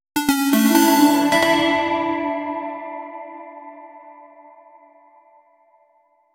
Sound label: medieval video game quest